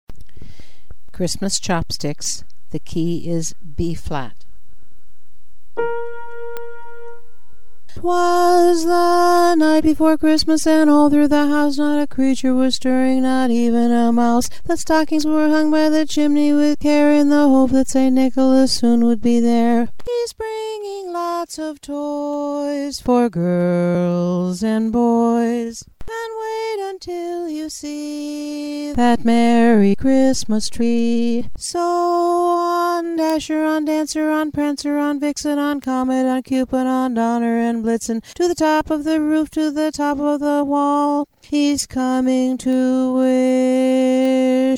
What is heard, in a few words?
Xmas Chopsticks Bass Dominant; holiday music